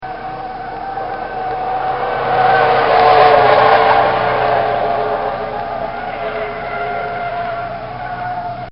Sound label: Short Bmw Skid; Transportation